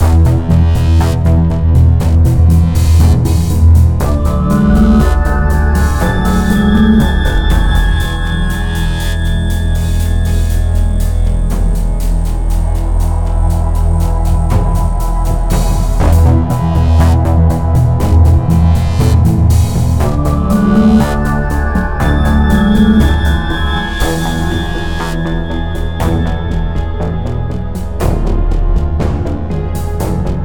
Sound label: Tense; Everspace 2